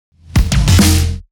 Fill; horizon

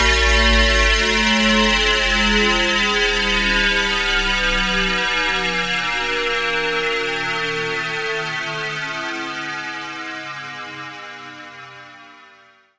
Faller 150; shimmer; Elongate

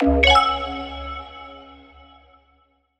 Ear Candy Db 150; radiance; Checkpoint

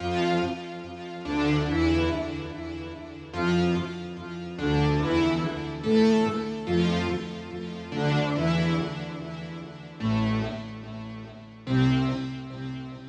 Lead Strings; hera

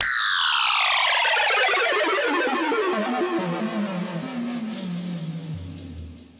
sfx broken siren; octane

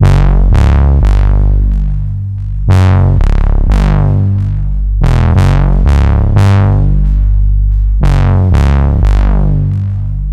Bass

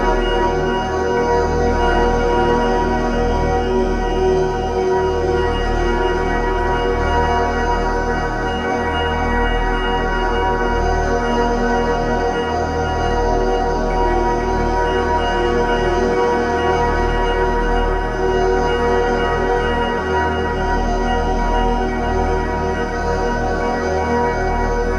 heavenly tonal atmospheres D#; paragon

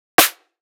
snare

